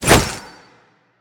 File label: fire; smite; manticore